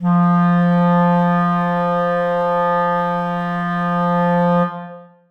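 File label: clarinets sustain f3; Sonatina Symphonic Orchestra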